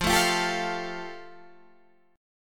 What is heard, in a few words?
F Major Add 9th, Guitar DADGAD